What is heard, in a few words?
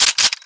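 pickup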